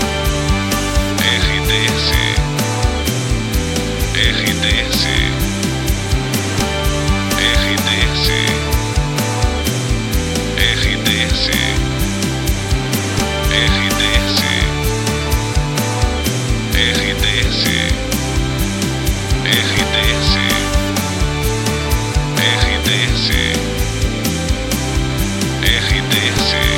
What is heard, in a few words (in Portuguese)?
TRILHA PARA LOCUCAO WEB NET JOVEM